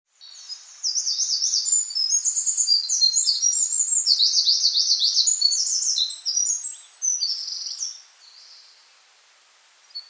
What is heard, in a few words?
Clay environment mountain; VR Sports